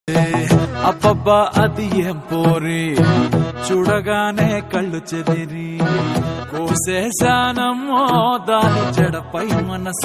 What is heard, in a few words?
movie song ringtone; chor bazar movie; akash puri